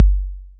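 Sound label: 808 bd, bass drum, drum machines; 808 Extended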